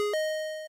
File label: happy mouse jump